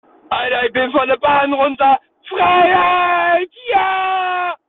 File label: notification